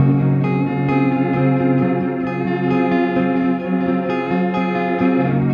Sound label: Tapeloopgtr, Guitartronics; Sonic Foundry (Sony Creative Software); Ma Ja Le Ethereal Textures